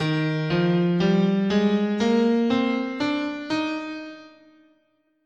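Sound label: D sharp Ionian, guitar, scale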